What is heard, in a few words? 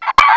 hen